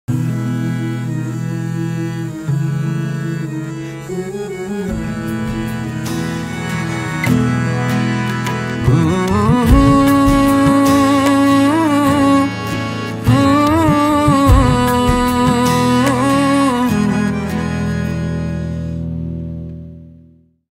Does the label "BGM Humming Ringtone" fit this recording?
Yes